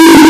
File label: loud bonk sfx